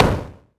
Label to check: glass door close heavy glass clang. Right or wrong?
Wrong